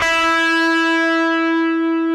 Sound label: DIST E4, DIST GUIT